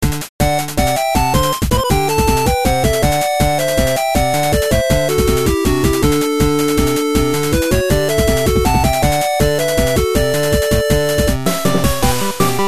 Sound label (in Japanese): キャラクターテーマっぽい